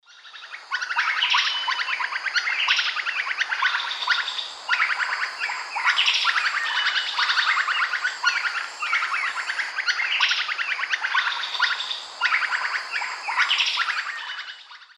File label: Odontophorus gujanensis, group alarm calls; S. America; Eastern Lowlands